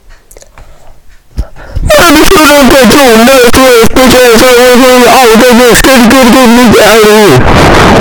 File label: ahh